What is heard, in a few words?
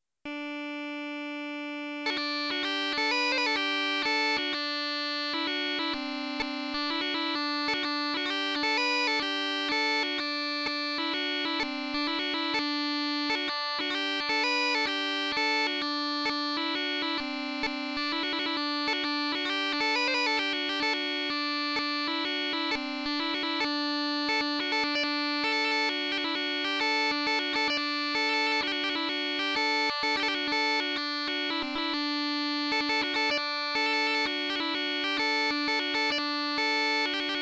swedish; technopipes